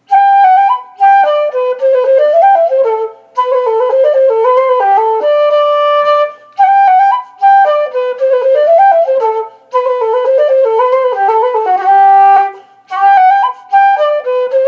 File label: polska; eklunda